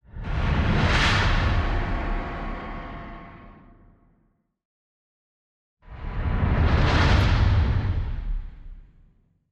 Dragon Reveal dramatic and recap, Cinematic Transitions; Orbital Emitter; Cinematic Transitions for Editors Volume 2